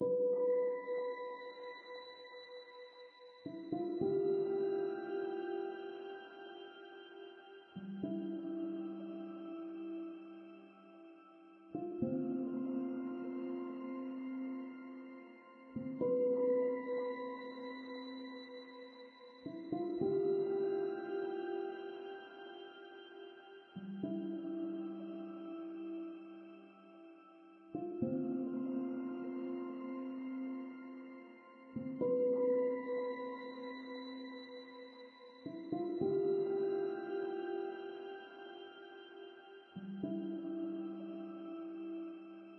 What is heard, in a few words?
Piano Theme Mellow